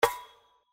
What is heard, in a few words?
Spin Stop; slots; Crazy Rockets